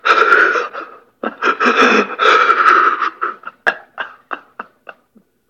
Soldier Cry

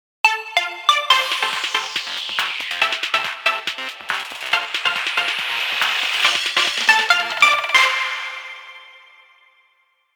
Radio Jingle (Noon); Animal Crossing New Horizons

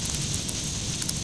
Toxin Spray Loop

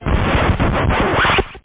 Needle

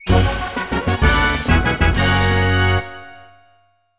Bonus Game - Failure; super smash bros. ultimate expanded vol. 01 super smash bros.

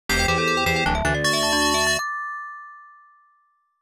Wave 5 Sudden Death Complete; Off the Wall arcade gamerip 1991

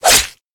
Whirlwind Strike; Idle Champions of the Forgotten Realms; Warrior

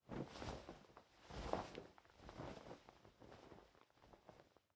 Noren Cloth; Devour; The Inn

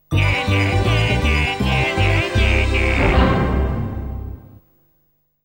Game Over; Fire and Ice; amiga cd32

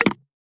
downworld gooddry; Gonner

